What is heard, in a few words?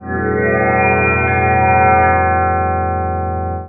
dreamy pad; Pads